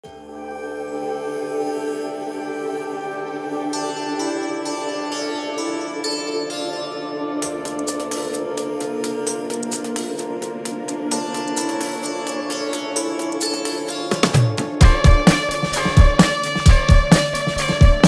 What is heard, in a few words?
ringtone hindi; Ganesh chaturthi